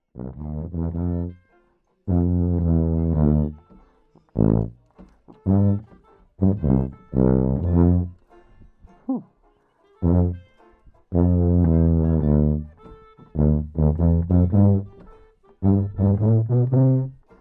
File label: Sousaphone